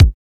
old school verby bass drum F sharp key

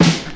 old school rich low end snare one shot e key

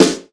smooth snare sound a key

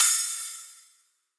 huge open hat one shot g key

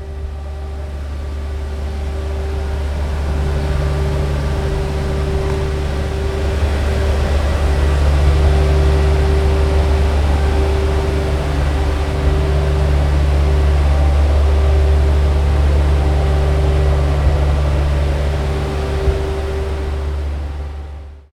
city recorded street sweeper

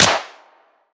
huge snare single hit e key

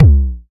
bass drum sound c sharp key

